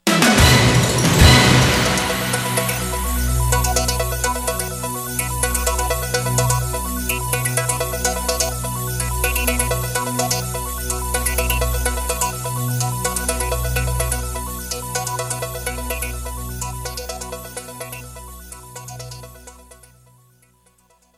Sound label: traffic & wx sounder; wcbs